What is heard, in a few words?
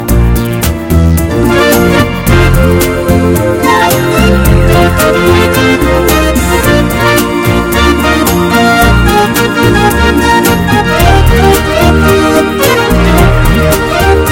Great Waltz Ballad